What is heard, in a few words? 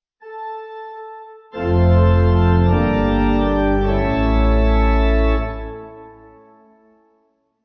Pipe